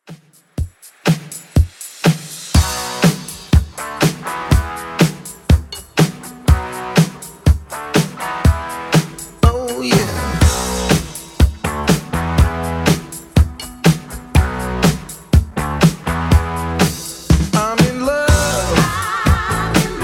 80s Redrum 122 bpm

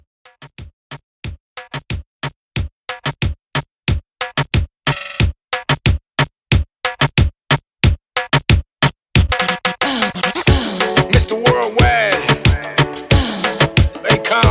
Latin Reggaeton 91 BPM

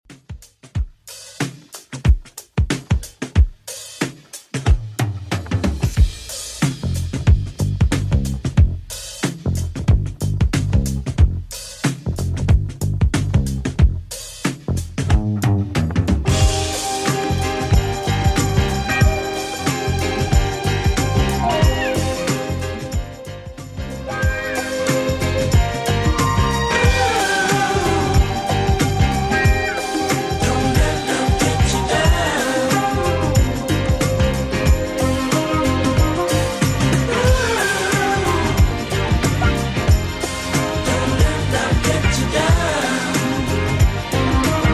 70s Redrum 92bpm

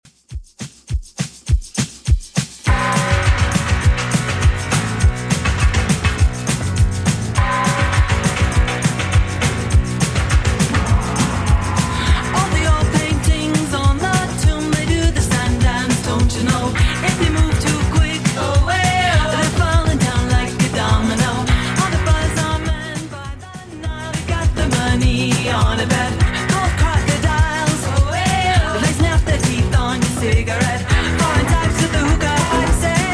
80s Redrum 102bpm

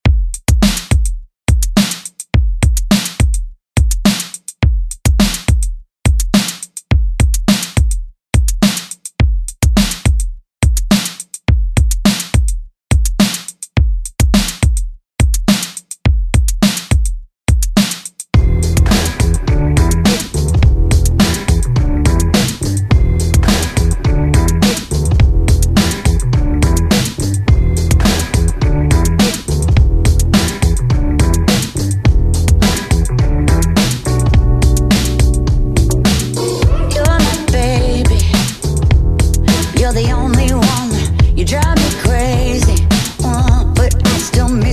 remix, 105bpm

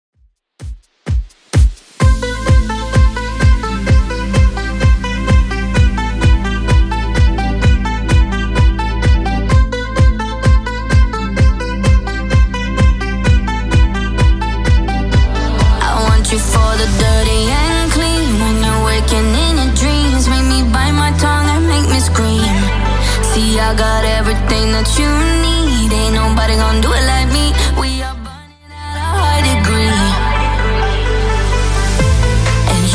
Top40 Redrum 128bpm

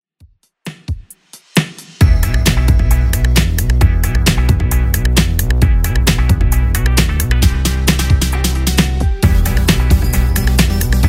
2Ks Redrum 133bpm

